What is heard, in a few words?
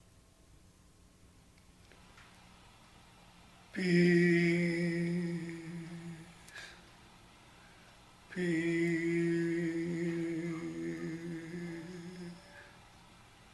Peace chant